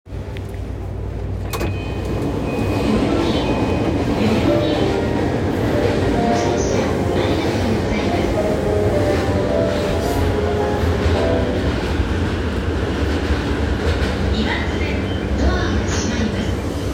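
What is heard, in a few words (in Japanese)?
山手線代々木 counterclockwise, train sounds; JR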